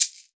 SHKR LEGG, Synclavier Percussion; Synclavier Percussion 2; World & Orchestral; ILIO

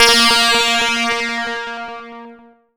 A#, Synclav FM; Big Fish Audio; SPACE1

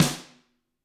GRETSCH SNRR, Drums; ILIO; Double Platinum Drums 1

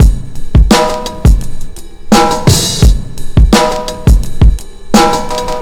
big, Loops, breaks; m8 breaks

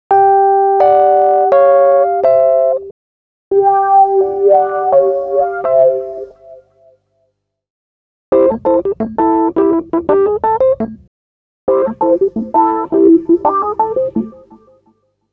breaks, Jungle; Zero-G Jungle Frenzy 2

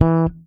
FRETMUTE E, PEDULLA BASS; Masterbits CLIMAX 7 - Bass

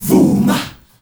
VOOH MAHH R, GRP CHANT; Spectrasonics; Heart of Africa 1

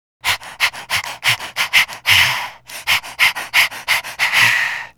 Breath, Vocal Toolbox, Sound Bites; Sonic Foundry (Sony Creative Software)

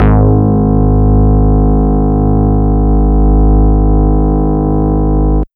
Bass Analog & Synth; CARBONARA; USB Soundscan vol.12; AKAI

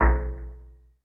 U220 FX Set, Drum Machines; Roland U220

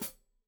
Hat Foot, Hi-Hat, Cymbals Stereo

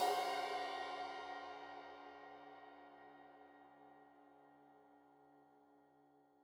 Crash 15in L edge, Cymbals Stereo

